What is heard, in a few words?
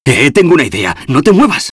Bonus; arthur and the revenge of maltazard; wii